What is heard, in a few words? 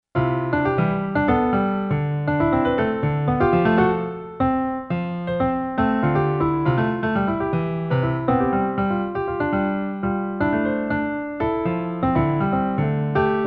Piano